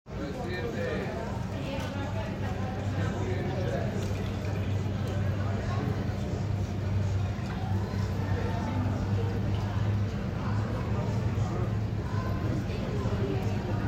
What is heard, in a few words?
JFK, chatter; FEILD RECORDING